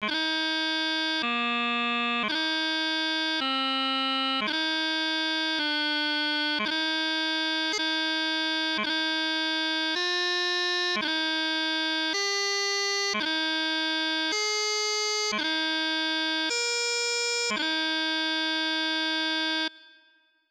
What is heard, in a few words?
D Throw, piping